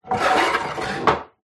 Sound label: household sliding wooden toilet roll holder slide down